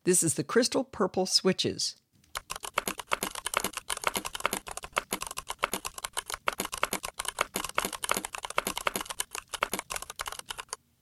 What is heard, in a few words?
Klack Crystal Purple